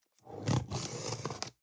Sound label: cutter cardboard open